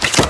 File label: bow; Dink Smallwood HD